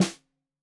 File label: Snare 65 Reg Stereo, Deeply Sampled Drums